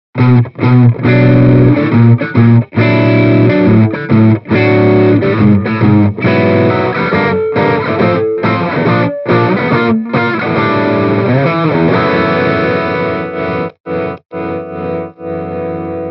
Mini Rox Mic Inside Nordik Gjallarhorn Overdrive Les Paul